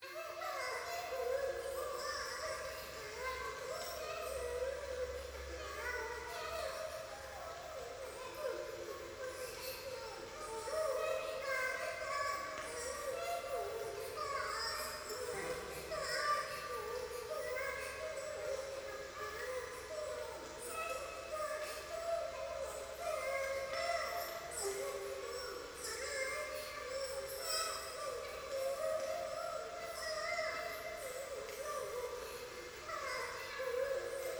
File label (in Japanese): 風呂 可愛い喘ぎ 雨, ASMR